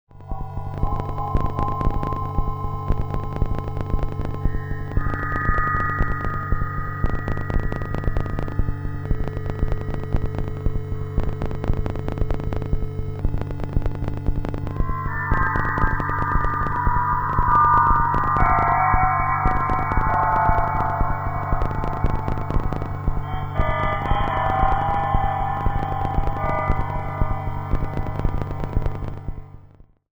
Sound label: subharchord; subharchordia